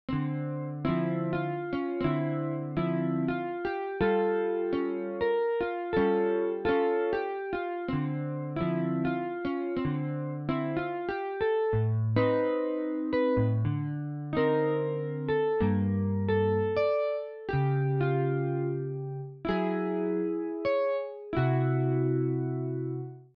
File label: synth